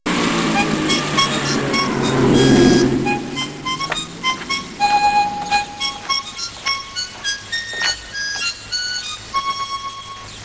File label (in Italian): Armonica